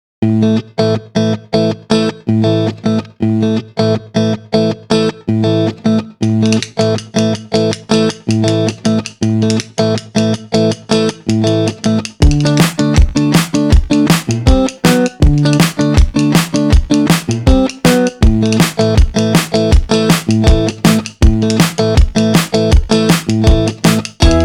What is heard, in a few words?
Old Guitar Prayer, blues